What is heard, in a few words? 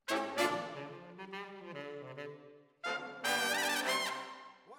ORTF, Jazz for piano